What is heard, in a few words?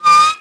bmx brake; terrains; Tony Hawk's Project 8; XBOX